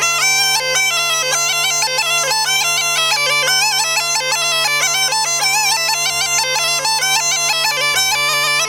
Nestinarsko Ruchenitsa Part 2, Small Gajda Lessons